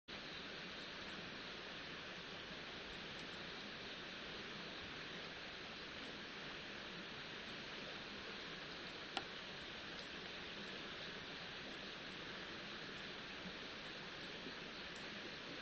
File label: riverside; Kyoto U; Ashiu